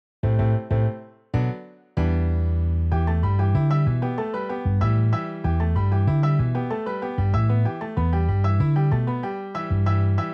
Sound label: Piano Bass MIDI